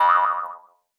jump, jumping slime; edgar; enemy

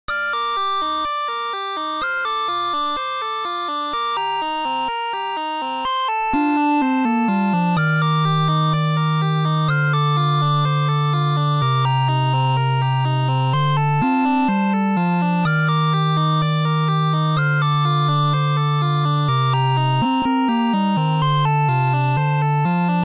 village forest, music; project hawk

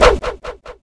flag pickup; ctf

